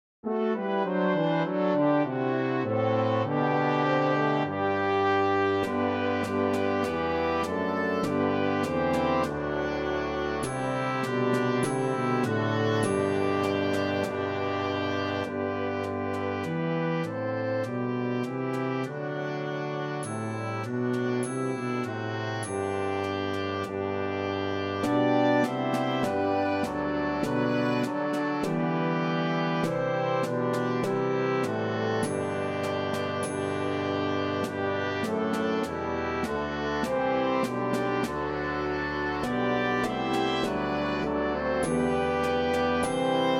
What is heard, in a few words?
Oboe track with Melody; Good King Wenceslas